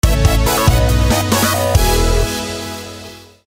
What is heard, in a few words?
Pacman Level Complete, music